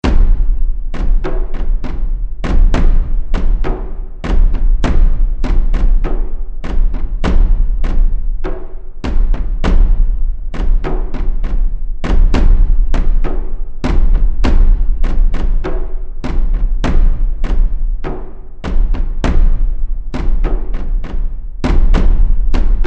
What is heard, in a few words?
Drums Of The Undead, scary soundscape